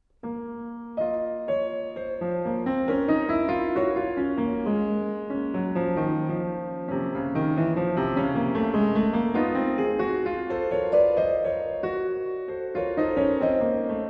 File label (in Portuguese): Valsas de Choro